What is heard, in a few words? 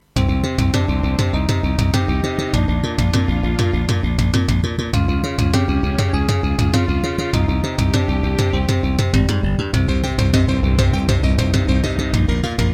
Anteg EasyMidi EM-100; Hocus Pocus